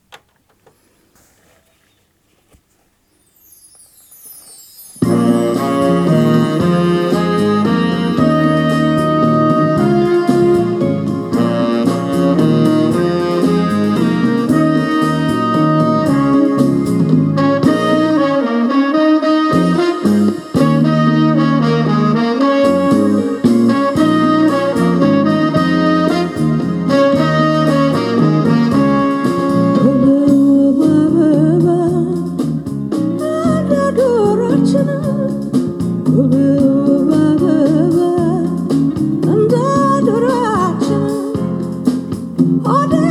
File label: eti pop, ethiopia music